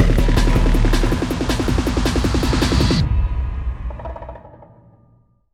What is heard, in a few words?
Music Boss Ending; Sifu; Boss Hideout4; Fengjie Death SYNC